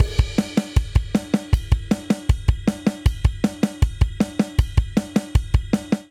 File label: Twist Outro 157 bpm; Ableton; Factory Packs; Drum Booth